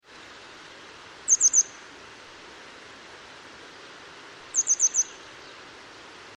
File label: Motacilla cinerea